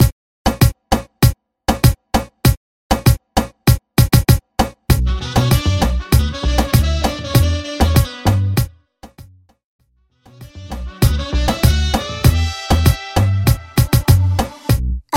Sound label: Top40 Pop Latin Mixshow Re Drum 98 Bpm